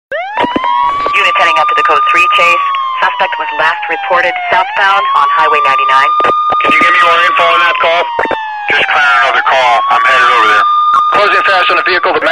звук сирена полиция рации разговоры на английском

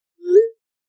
Звук отправки смс на iphone

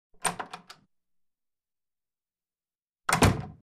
Открыли и закрыли дверь в кабинет Opened and closed the door to the office

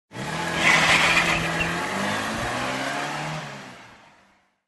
Звук шлифовки колес

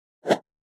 Переход Скорость

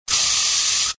Звук распыления освежителя воздуха

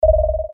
Звук устройство вышло из строя

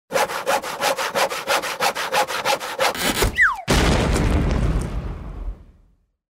Звук персонажа режет пилой ветку на которой сидит

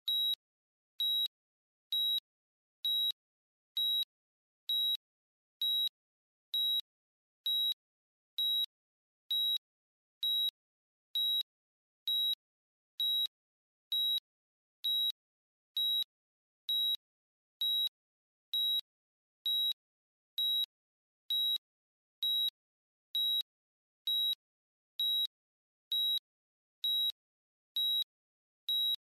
Звук кардиомонитора

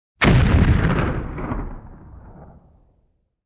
Взрыв

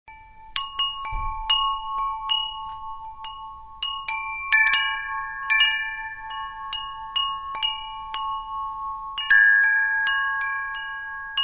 Звон дверного колокольчика

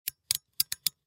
Звук выключателя у настольной лампы в офисе

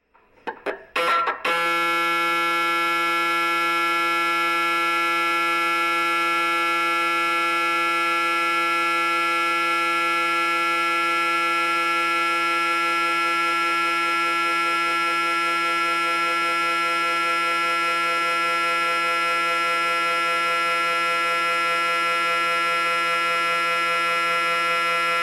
Звук мрт

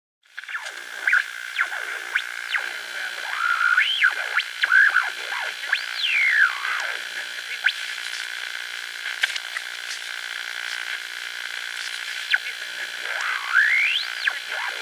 Звук свиста радио тюнера

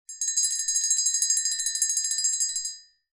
Звук колокольчика которым зовут официанта прислугу

